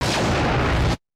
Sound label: SPACE FX 140; SpaceSounds